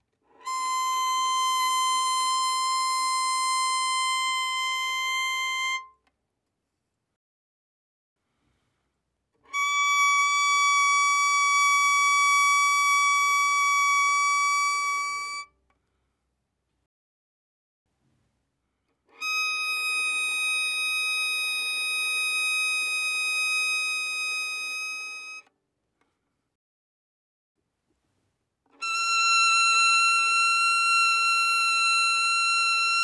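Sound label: Violin arco fortissimo on E string C6 Gb7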